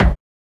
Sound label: TOM